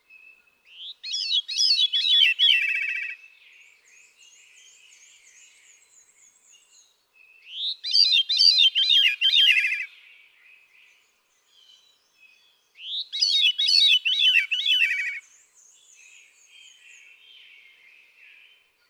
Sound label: Veery